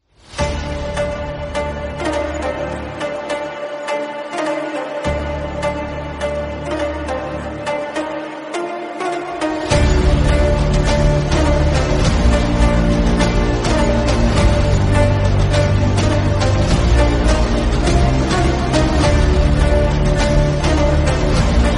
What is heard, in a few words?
trending instrumental background music tone ringtone